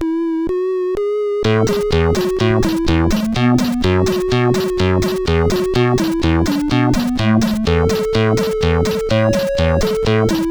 tune sid CSG8580R5; Shit Happens tune 5

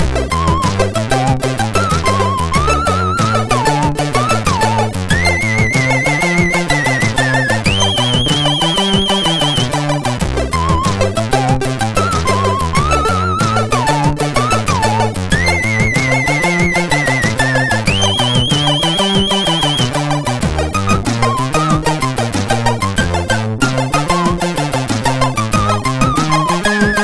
Barne Sang, MOS6581R3

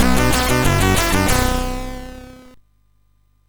sid MOS6581R3; Alien Syndrome preview

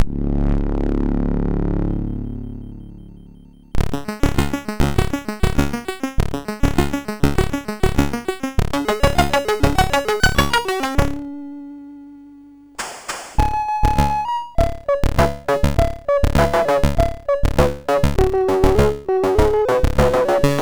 sid MOS6581R4; Theatre Europe

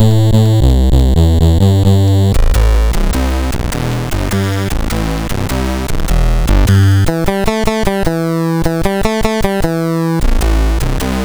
sid MOS6581R3; Hutfur

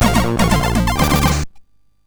Die Hard, MOS6581R4

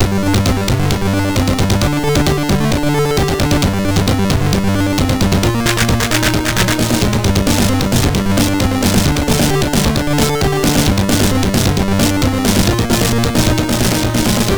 MOS6581R4, sid

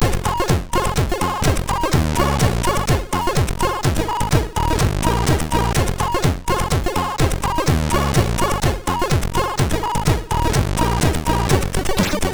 sid MOS6581R3

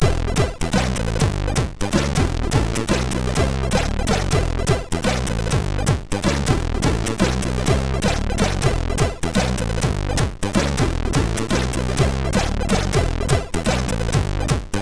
sid MOS6581R3

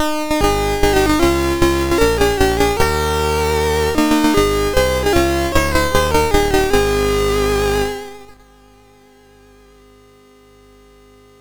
sid MOS6581R2; Jackal NTSC

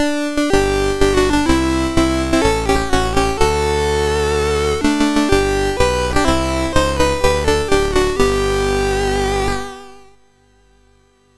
sid MOS6581R4; Jackal

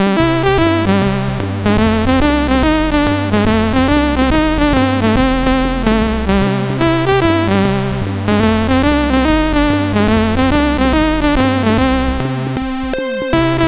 BASIC, CSG8580R5; Soundcontrol 2 Demo

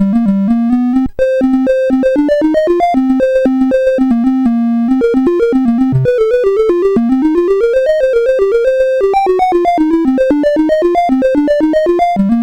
BASIC, sid CSG8580R5; Latimer Joey

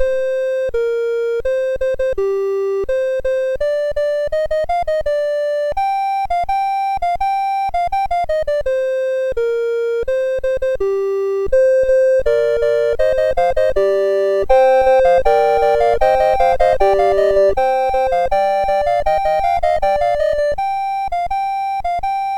A Musical Round BASIC, MOS6581R4; Latimer Joey

